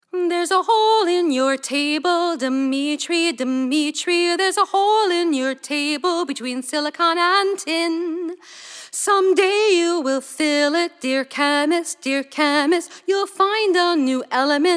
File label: Germanium Element Jingles